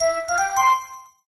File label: Bell Interest, notifications; Honor; MagicOS 7.1